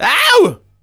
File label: Vocal Oneshots; Vengeance Samples; Vocals